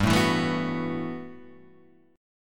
B 7th Suspended 4th G; B7sus4/G; strummed; JGuitar

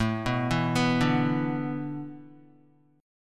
A Suspended 2nd Suspended 4th, Guitar; Asus2sus4; arpeggiated; 4,2,4,4,2,x; 4F,4A,5C,5G,5C,6E; JGuitar

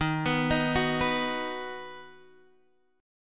Eb Minor Sharp 5th Guitar Half Step Down

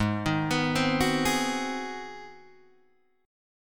Ab 7th Sharp 9th Guitar Open D, chord

